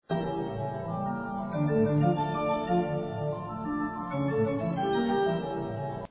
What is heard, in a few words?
fugue d minor